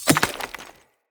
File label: Throwing Knives Impact Rock; Weapon Impacts; Environment